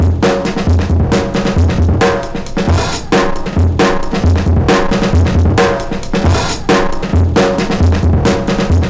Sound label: VCM Top, Serge Modular